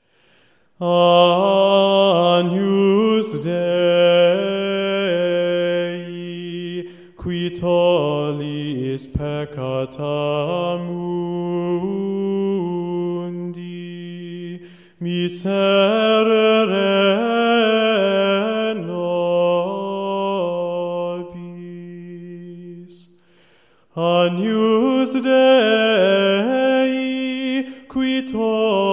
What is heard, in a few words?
Agnus Dei Gregorian Chant; VIII from Mass VIII; Brebeuf Hymnal